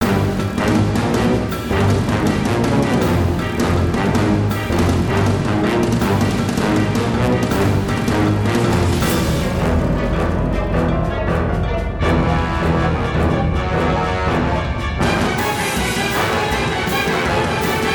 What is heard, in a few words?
Boss Loop; Aegis Wing; XBLA; Xbox 360